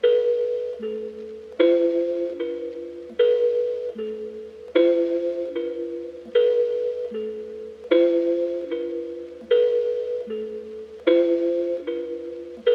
76 BPM G# Min Kalimba; Trap; Loop Stems; Cymatics; 2022 Melody Collection; Trap Pt 1; Balenciaga

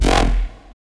Stab D, Bass

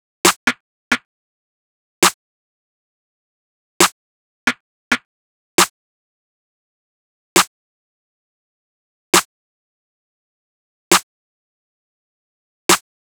Drum Loop - 135 BPM Snare; Loop Stems; Cymatics; DOPE DRUMS VOL. 1; Chemist Drum Loop

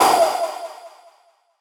Explosion Vox; trap; Vocals; Cymatics; Trap Starter Pack

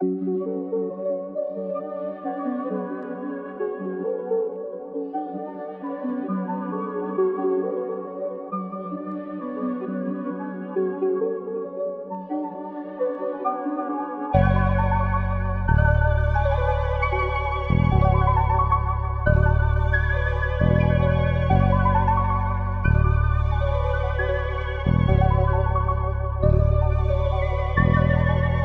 134 BPM F# Min; Cymatics; 2022 Melody USB Expansion; Infinite

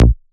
Ashes Bass B

